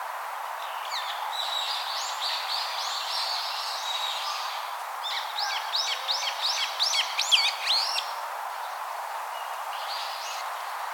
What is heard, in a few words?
Sturnus philippensis